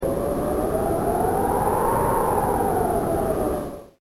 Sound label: WIND